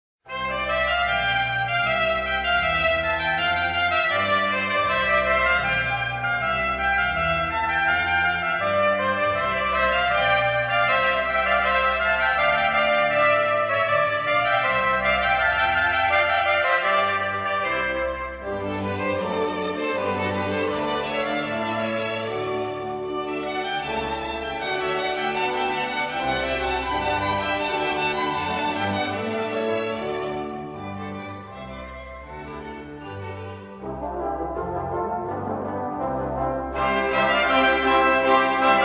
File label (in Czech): fanfary